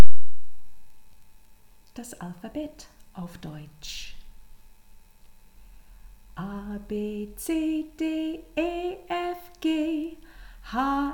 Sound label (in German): Deutsch Alphabet Lied